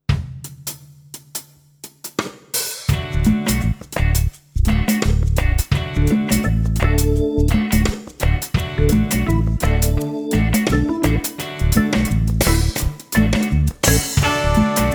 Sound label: Reggae Single